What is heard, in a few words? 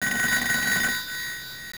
telephone